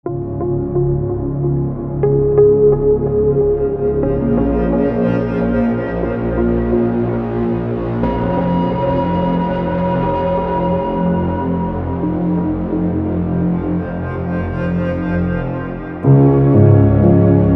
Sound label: Sci-Fi Soundscapes; Nebula